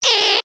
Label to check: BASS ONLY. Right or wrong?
Wrong